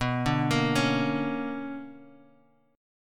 B Minor Major 9th, Guitar, Open G

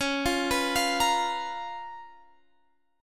C sharp 13th, Guitar, Drop D

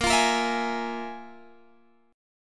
F Minor Major 7th Double Flat 5th, A sharp

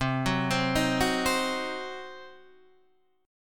C Diminished, Guitar, Open D

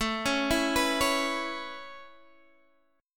A Major Add 9th, Guitar, Half Step Down

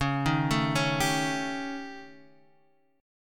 Eb 7th Flat 5th Db

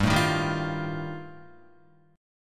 G 7th Suspended 2nd Sharp 5th G, Guitar; 2,0,3,0,3,1; down; 4F,4A,5C,5G,5C,6E

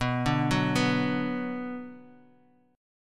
B Minor B Guitar; arpeggiated; JGuitar

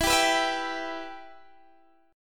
E Minor E, Guitar, Open D; x,19,17,17,19,17; down

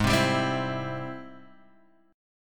G sharp Augmented Major 7th

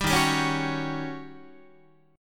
D sharp 7th Sharp 9th Guitar; strummed; JGuitar

